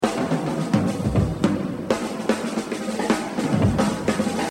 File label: Exercise, jazz drumming; Exercise 27 Buddy Rich - 80%; The Evolution of Jazz Drumming (Gottlieb)